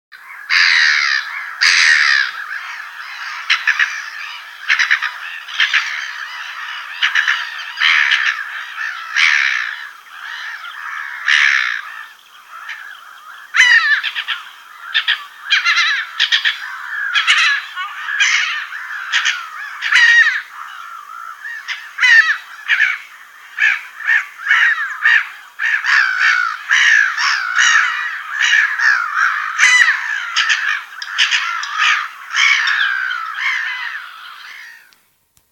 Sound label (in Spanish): gaviota reidora